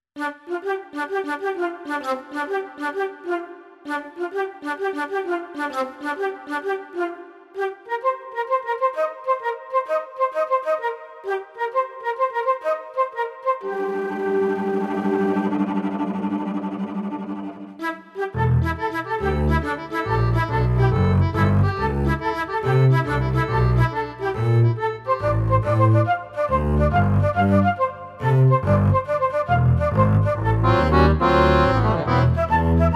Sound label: strange blues